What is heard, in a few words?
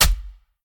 lance stab; smite; lancelot